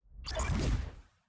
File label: digital whoosh